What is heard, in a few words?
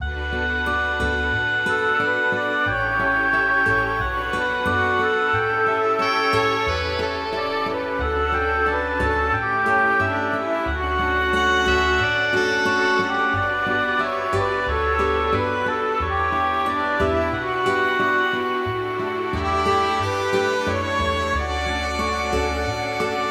short smoothly orchestral piece; Johan Titus Vries Bak